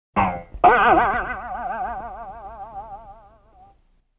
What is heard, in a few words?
Bouncy Sounder